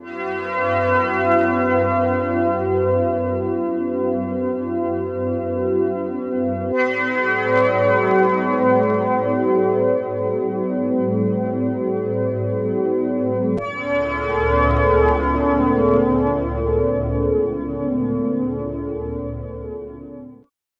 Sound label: pad idyllic momentum